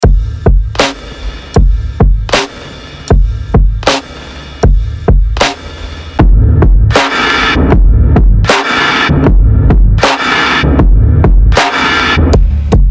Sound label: Raw Acoustic Downtempo Kit; Series Kit 02